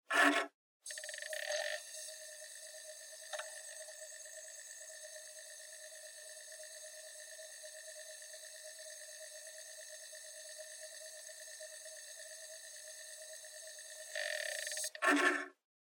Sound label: Portable Stereo Driving Noise CD Play Stop